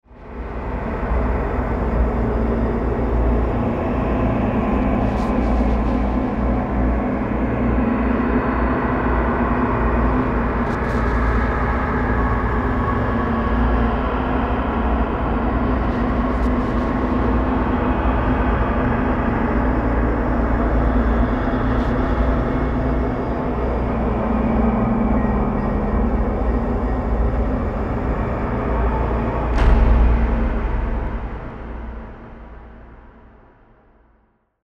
VERY POSSESSED DRONE

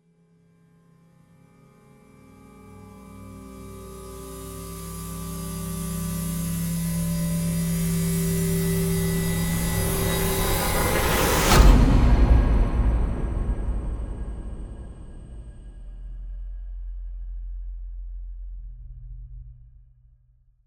MONSTER SCAPE RISE IMPACT

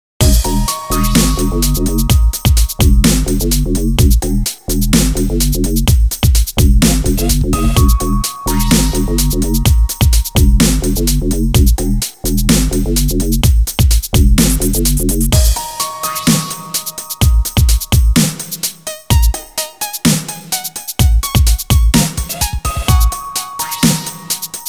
halftime dub mix

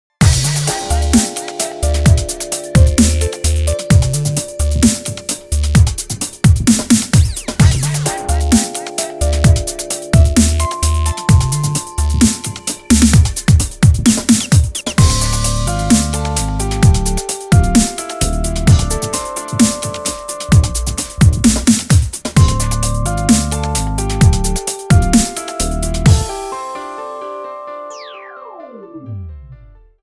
halftime dub mix

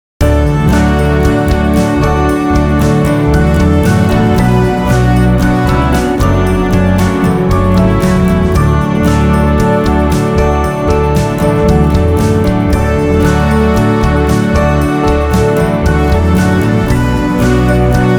(INSTRUMENTAL)

